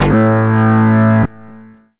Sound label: Shift Change